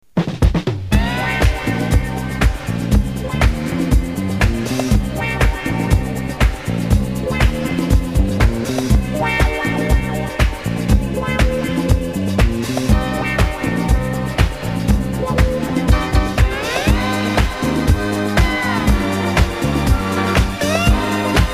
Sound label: Instrumental